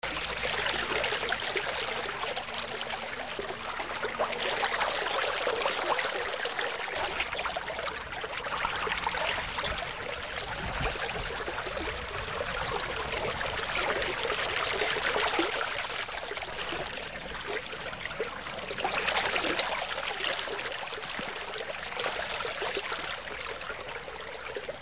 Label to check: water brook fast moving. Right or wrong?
Right